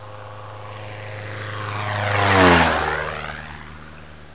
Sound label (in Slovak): Lietadlo; Mesto a dvor; Revelation Sight and Sound SK